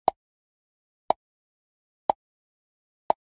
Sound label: Generic Click Glass Small